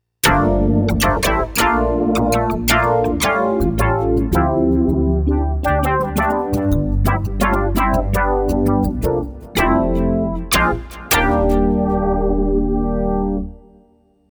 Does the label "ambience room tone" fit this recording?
No